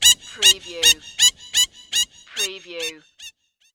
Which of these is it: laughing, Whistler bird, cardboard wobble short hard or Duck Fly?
Duck Fly